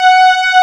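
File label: G5, SYNTHESIZER, analog STRINGS; AKAI S6000 CD-ROM - Volume 1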